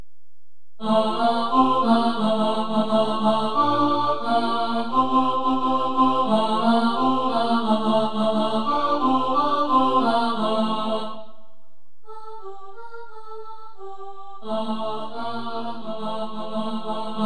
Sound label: bwana tenor, choir